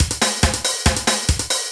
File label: train